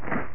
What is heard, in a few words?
walk grass; graal2001